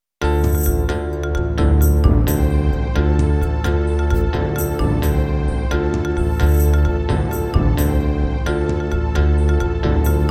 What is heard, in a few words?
Miditemp DoX-1, wavetable; Heretic